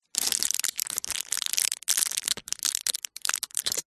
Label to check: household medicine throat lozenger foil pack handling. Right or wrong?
Right